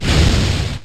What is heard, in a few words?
Mystical Fire; pokemon reborn e19 2022